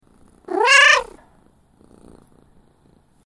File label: cat sound